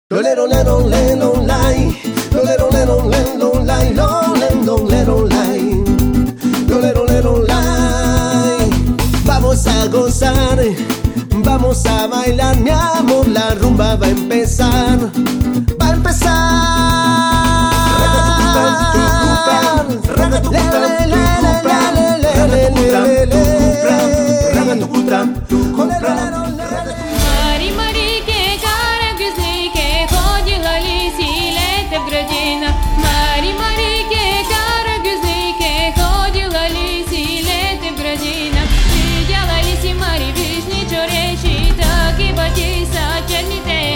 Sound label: voices choirs song